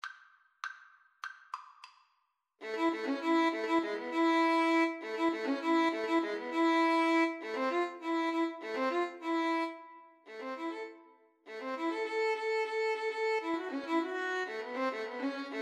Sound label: violin cello duet